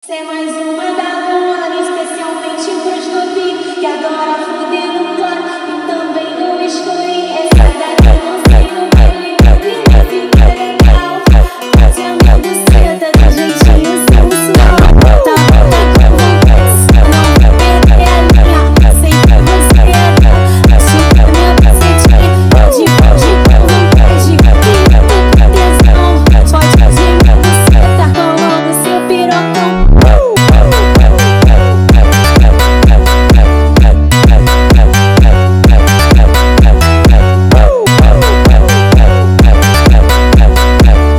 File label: phonk speed up